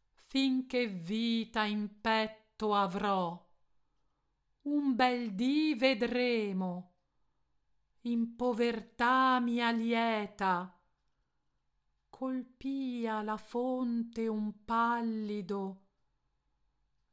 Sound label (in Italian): allofoni arie